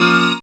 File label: syn a#, Dance Synths Stabs & Hooks; vol.37 - Dance Synths